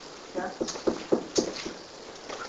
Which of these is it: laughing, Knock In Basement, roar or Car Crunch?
Knock In Basement